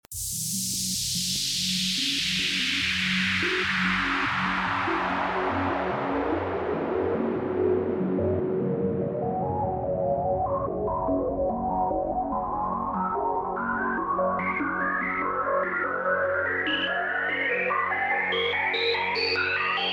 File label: fullon psychedelic trance